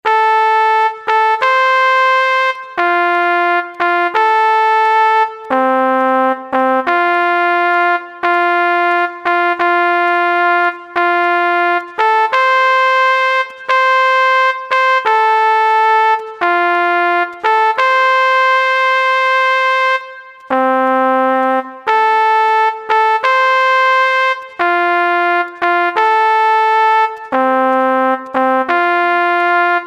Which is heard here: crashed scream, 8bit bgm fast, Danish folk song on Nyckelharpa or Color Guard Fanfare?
Color Guard Fanfare